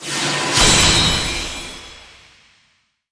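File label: Card Exp Excellent; Card Level Up Excellent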